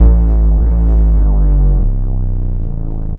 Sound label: Swoop Bass, Drum N Bass; Sonic Foundry (Sony Creative Software); Knutrix Drum & Bass